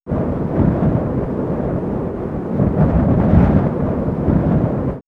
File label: Nature Winter Wind; X-Static Goldmine